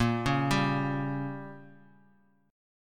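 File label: B flat Minor 6th down; arpeggiated; JGuitar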